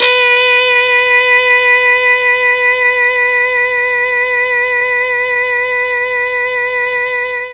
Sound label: B3 MKII SAX, Mellotron; Mike Pinder - Mellotron Archive CD